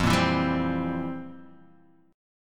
F Major 7th Suspended 2nd Suspended 4th, Guitar, DADGAD; 3,1,2,0,3,x; down; chord shape